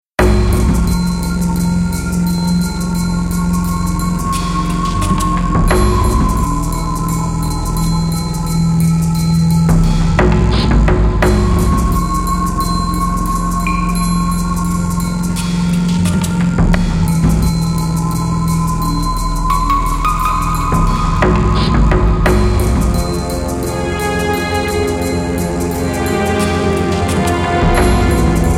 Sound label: Small zawa Instrumental, Suspense